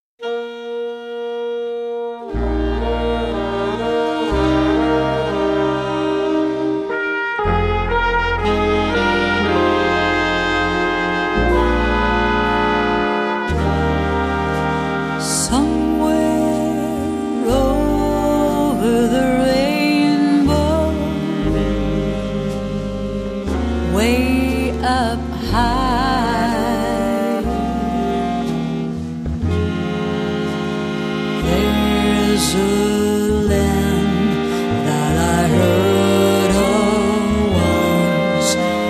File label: swing orchestra